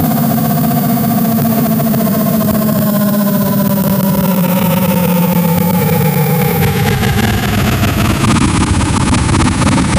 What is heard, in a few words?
rocket rising but in cart